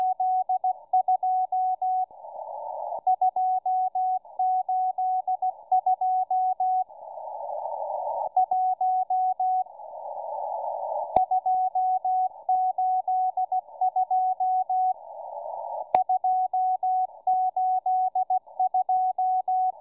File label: morse